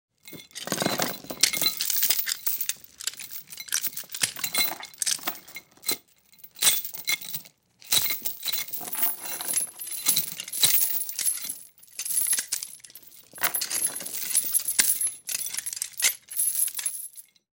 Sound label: Metal Chain Movement Clamp Sync; Fairlight Sound Lib